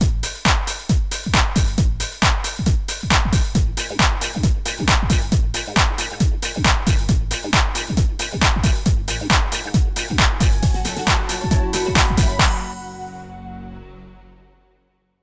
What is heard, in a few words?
Stinger; Skeleton Man Level 2